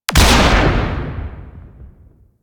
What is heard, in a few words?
Modular Secondary Barrel Fire; Warframe; SU Modular Guns